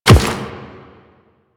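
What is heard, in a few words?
step on rock, footsteps; Warframe; The New War; Kahl; Quests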